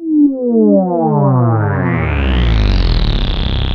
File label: Korg, FX